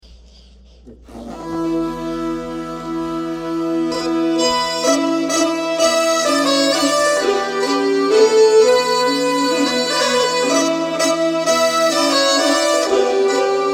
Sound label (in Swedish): säckpipa Hardingfela; BJörndansen